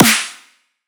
Snare

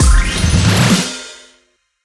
Keys Added

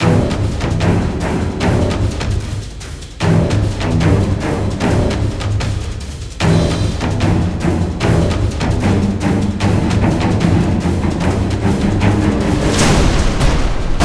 Base Loop low; extinction deluxe edition; ps4 windows xbox one

